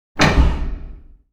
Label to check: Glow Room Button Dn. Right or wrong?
Right